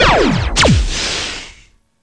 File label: ELECTRO, SPATIAL; East West - Global Sounds